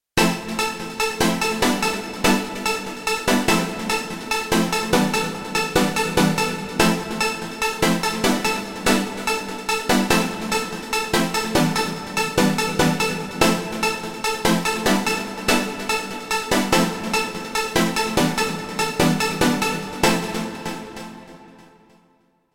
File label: Club Finger; Audition